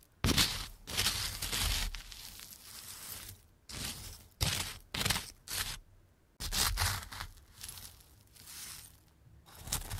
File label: asmr